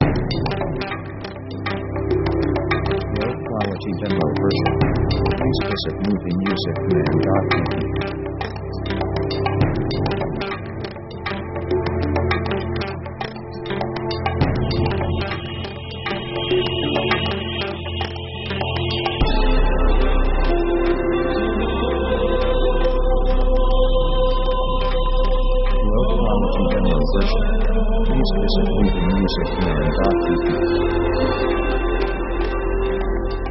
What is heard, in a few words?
ethnic India